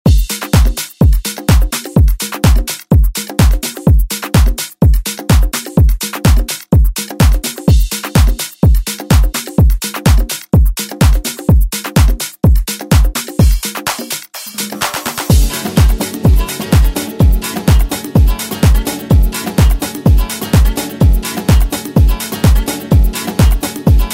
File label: House